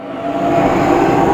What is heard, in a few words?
Bow; Conquer Online 2.0